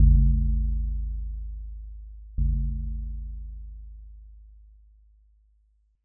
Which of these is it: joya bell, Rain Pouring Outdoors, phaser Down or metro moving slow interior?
joya bell